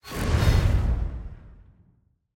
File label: UI Menu Loading Finish